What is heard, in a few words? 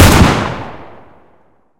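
heavy pistol, Guns Fire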